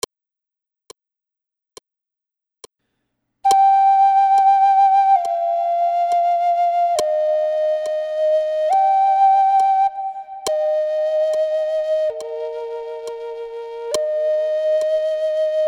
Pachelbel Cannon Flute

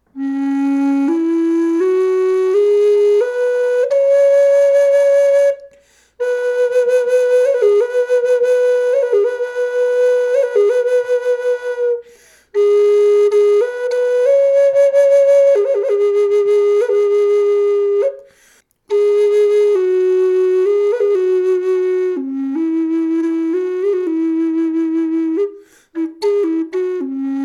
natural, Flute

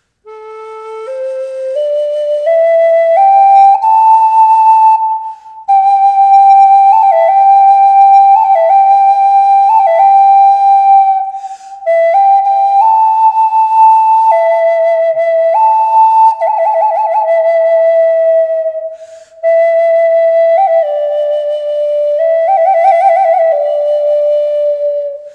Student Flute

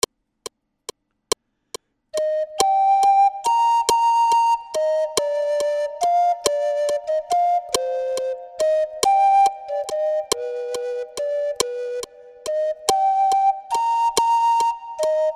Greensleeves Flute

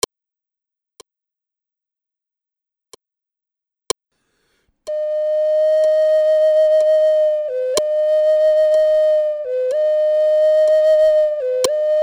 Sunrise Flute; Zuni

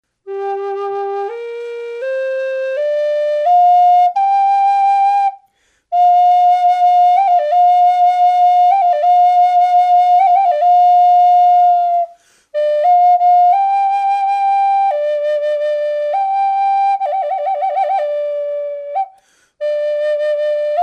First Flute

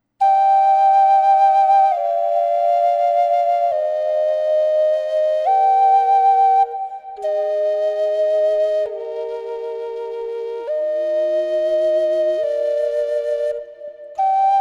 Pachelbel Cannon

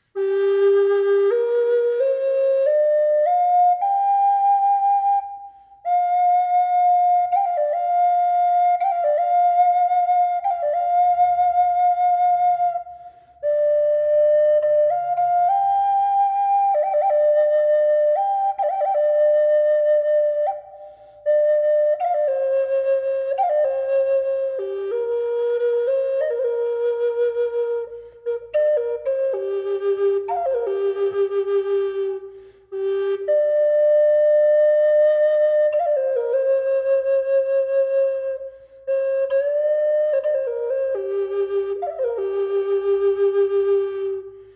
reverb, Flute

